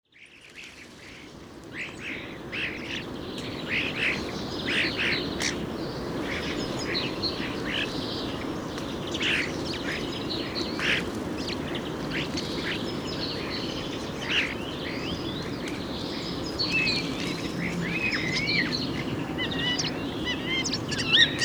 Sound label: common starling